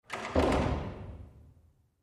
Metal Door Close Off, push bar

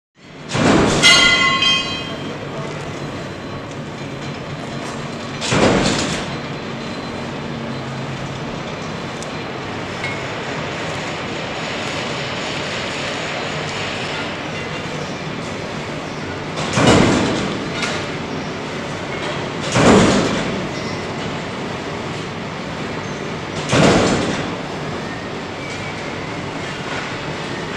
Factory Atmos Pipes, factory

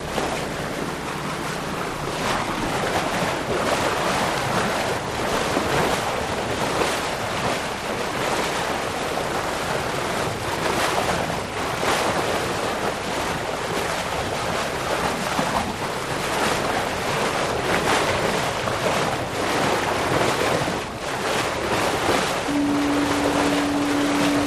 Motorized Sailboat, bow wash

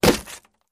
Bullet Hits Wood Soft

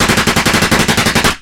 Uzi Rapid Fire Burst

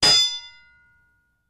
Hammer Hitting Horse, horse shoe; Horse Shoes The Game

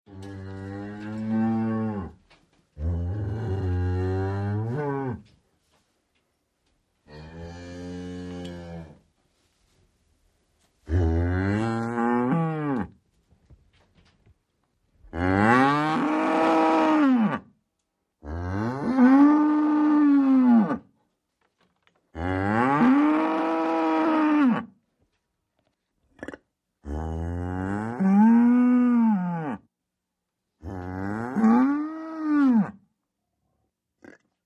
Cow Moos Various 24x

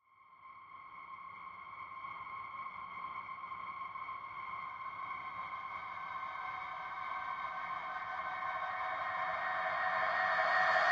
Horror Atmos Evolving

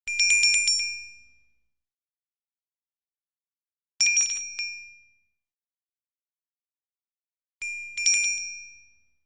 Glass Bells 3 Glass Be

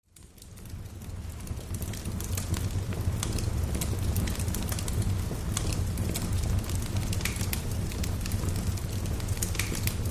Fireplace Fire Smal

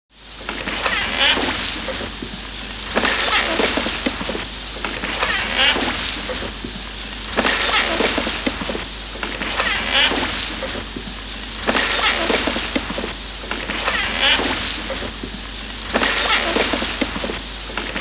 Boat Rowing Constant, rowboat & canoe

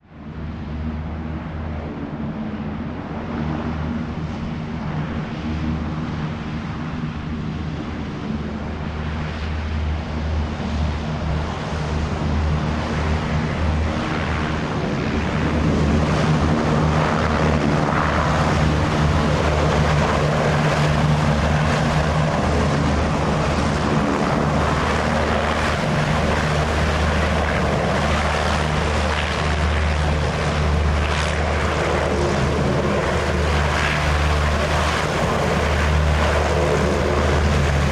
landing, hovercraft; lcac

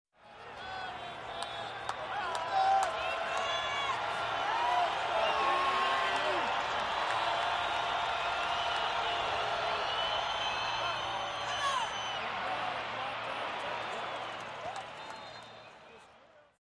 Football Crowd Exci, sports arena football

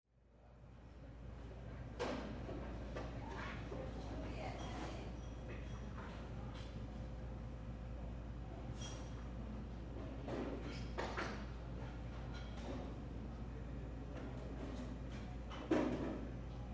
Asian Restaurant Amb

